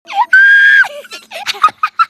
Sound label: combo burst; osu!; Retronix 2.0